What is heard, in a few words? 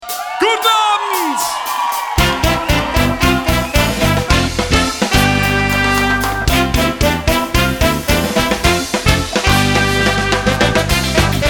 Ska